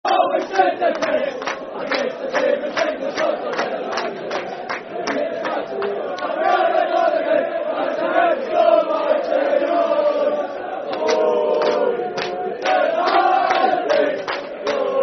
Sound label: Football Chant (Soccer Song), Hibernian; Glory Glory To The Hibees; Hibernian Hfc